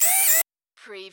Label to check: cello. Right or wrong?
Wrong